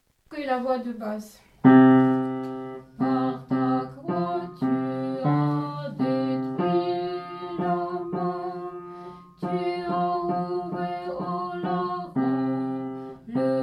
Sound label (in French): Tropaire Ton7 Basse; Voix basse